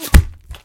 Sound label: bullet meat, guns hits